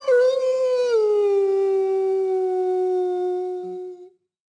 howl; Rust

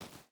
footstep barefoot gravel jump start; Rust

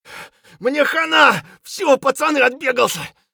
hurt near death; Escape from Tarkov; scav4

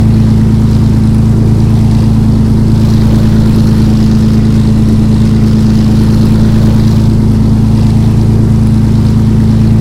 avro lancaster rear, engines; War Thunder

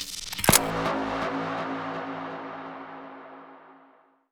quest started; Escape from Tarkov

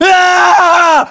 melee charge; english; voiceovers

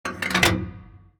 gun reload; World of Tanks

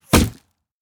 wood bullet impact; Rust

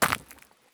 sprint glass; Escape from Tarkov

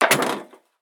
landing proflist; Escape from Tarkov

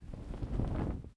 ambient; World of Tanks

